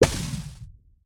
导弹命中声; 潜影贝

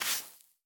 刷子刷沙子声

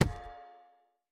錾制书架插入附魔书声

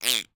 海豚待机声

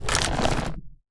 嘎枝停用声

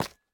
方解石踩踏声

